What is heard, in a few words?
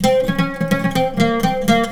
SITAR ETHNO 125; eJay; Guitar